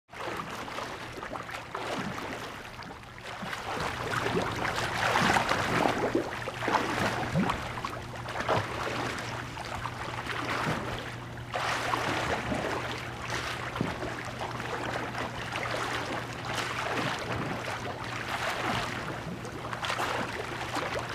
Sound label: Man swims